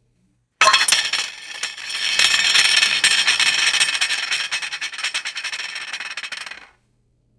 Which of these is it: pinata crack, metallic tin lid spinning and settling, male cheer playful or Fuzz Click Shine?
metallic tin lid spinning and settling